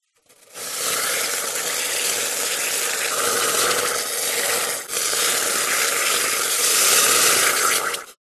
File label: household water sizzle swirl around in pan; zapsplat